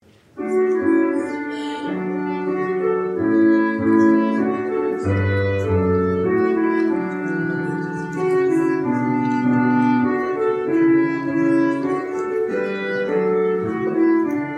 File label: preservice, instrumentalists